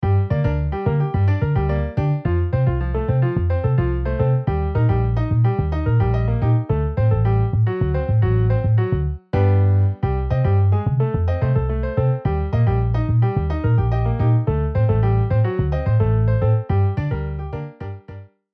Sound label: Piano Bass MIDI